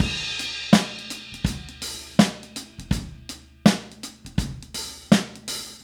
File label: Soul Drums Crash, 70s Analog Funk; Sonic Foundry (Sony Creative Software); What it Is!